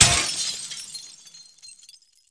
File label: glass